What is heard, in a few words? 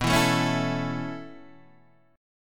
B 7th Suspended 2nd Suspended 4th down Guitar Open D, chord